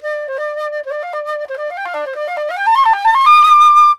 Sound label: D pentatonic